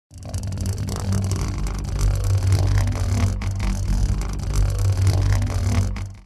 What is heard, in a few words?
pvc pipe